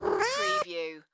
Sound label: Cat Meow